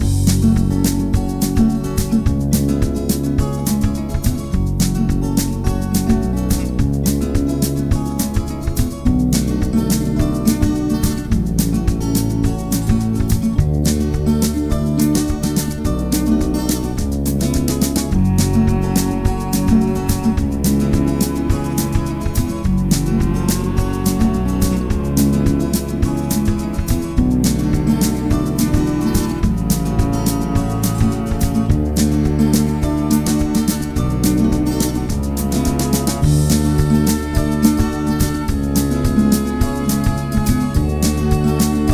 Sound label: driving